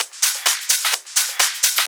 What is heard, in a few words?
Hihat Loop 128 BPM